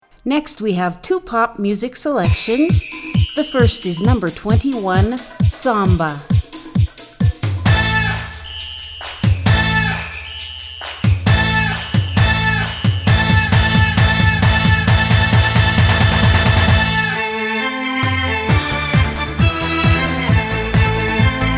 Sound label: Samba